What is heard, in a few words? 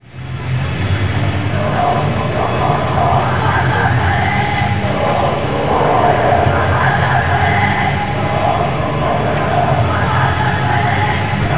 ouaaaah